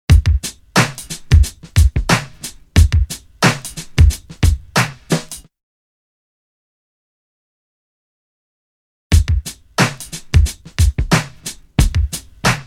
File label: Drum loop; BEST OF DRUM BREAKS 30